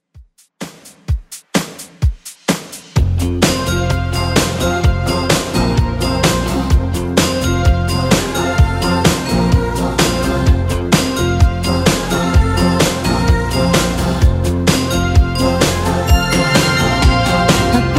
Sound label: 80s Redrum 128bpm